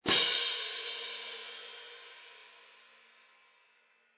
20" Artisan Traditional Symphonic Medium Light Cymbals; sabian